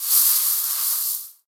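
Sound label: ice storm; kult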